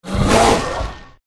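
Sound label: Bear attack bottom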